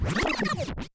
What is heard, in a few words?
back spin med, vinyl record